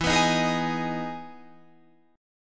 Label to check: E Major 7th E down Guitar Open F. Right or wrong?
Right